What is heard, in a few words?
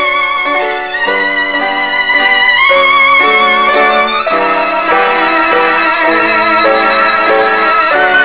violano